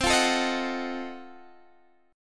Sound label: F 7th Flat 9th C